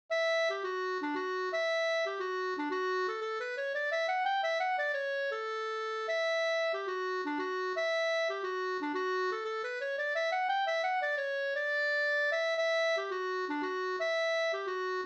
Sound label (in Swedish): polska Tokpolska; LJ Holmgren Vg